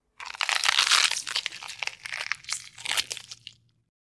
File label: body gore